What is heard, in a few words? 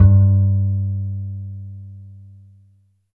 BAS G2; Phat & Phunky; East West